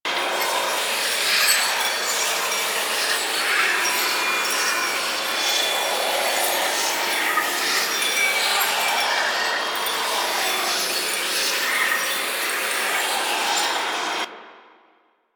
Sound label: Floating Item Loop; Octopede; Warframe; Entrati; Swarm